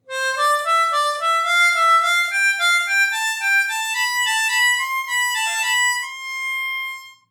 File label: Triplets Ascending